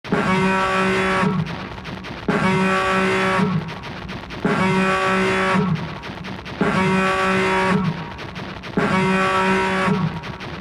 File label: Mothership Alarm